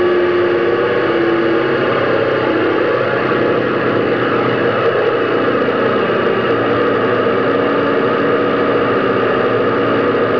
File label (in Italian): Aereo; trasporti; aerei